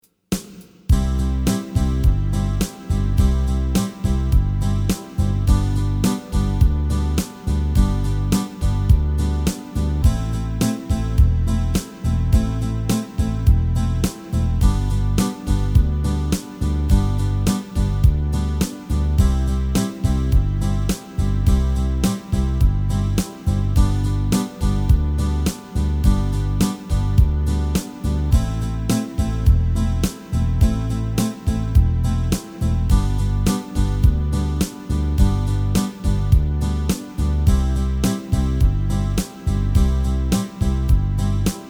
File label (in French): C I V VI V, guitare